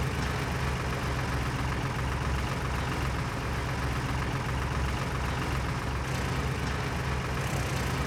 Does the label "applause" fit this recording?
No